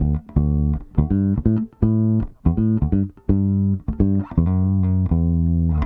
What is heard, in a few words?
Soul Bass B, 70s Analog Funk; Sonic Foundry (Sony Creative Software); What it Is!; Session 12 - Soul 1